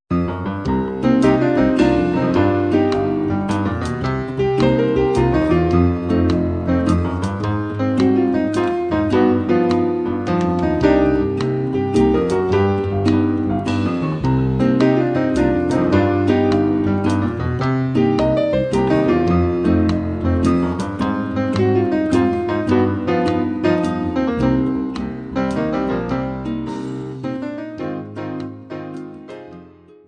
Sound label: blues